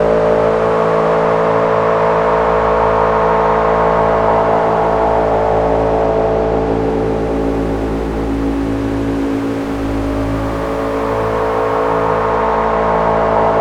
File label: SUPR FUZ PAD